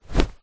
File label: foley coat jacket thick arm sleeve movement; zapsplat